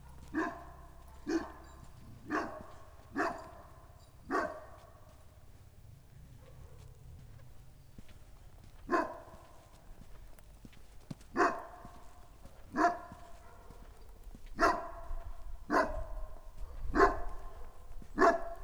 bernáthegyi, kutya; nagykoros; parasztudvar

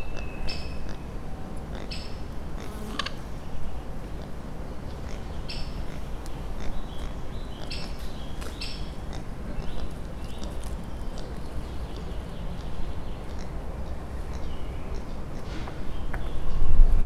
felhangosított felvétel, vöröshasú barnamaki; szegedi zoo 2011

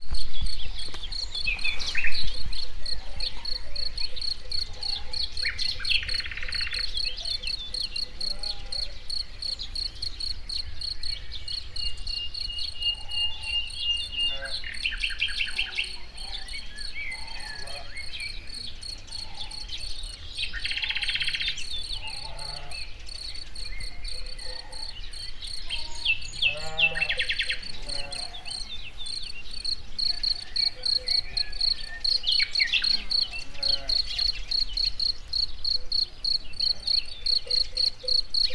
távolodó nyáj és tücsök; Egerszög

